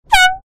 airhorn sound

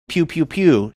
pew pew pew

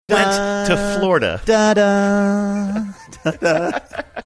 singing in the dark theme

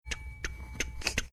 making mouth clicking sounds

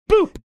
boop

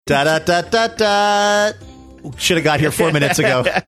singing jingle saying should a go there; mcdonalds

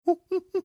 mimicking dream whimpering; olives dream whimpering

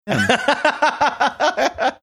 laughing

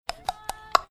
making mouth clicking sounds

